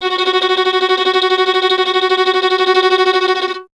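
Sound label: string violin tremolo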